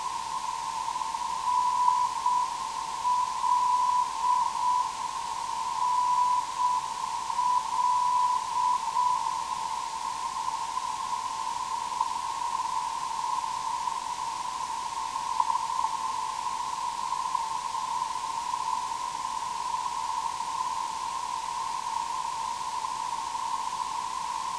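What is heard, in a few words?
324kHz, non-directional beacon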